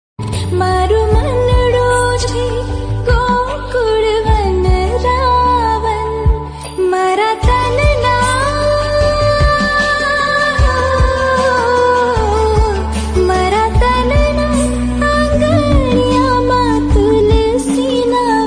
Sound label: krishna bhajan devotional